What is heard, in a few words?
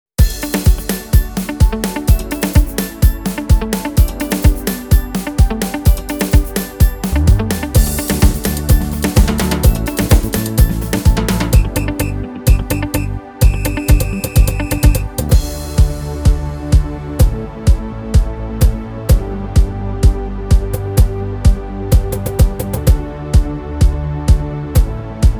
Samba Dance